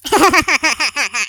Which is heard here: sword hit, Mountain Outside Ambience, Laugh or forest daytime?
Laugh